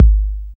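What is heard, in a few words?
bd, 808 bass drum; Sound & Vision - Gigapack I; DANCE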